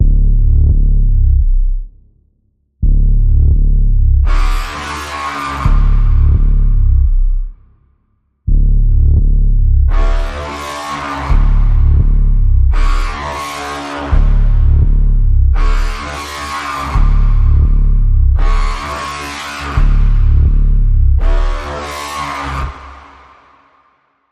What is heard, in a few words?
Insidious Pulse Bends D, Key of D